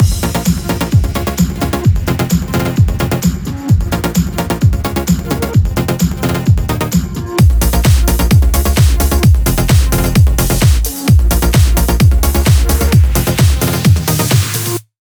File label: 130BPM Mix; Vengeance Samples; VFH1 130BPM Remnant Kit